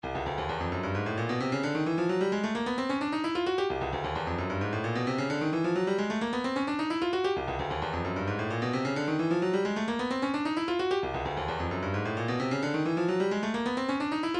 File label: 131 tambourine, midi